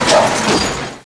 walk

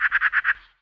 birds

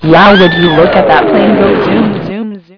plane zoom

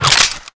rock

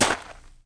pl gravel, player

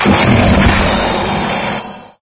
strike, hydra; scmod

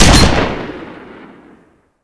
fire shotty; halo shotty

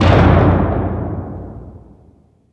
clamps close

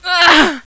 uhhhh

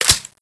greaser clip in, weapons

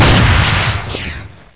Rocket fire

